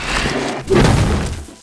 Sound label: attack